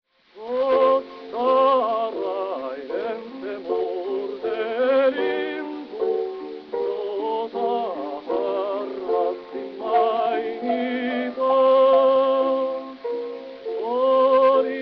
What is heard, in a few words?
Gramophone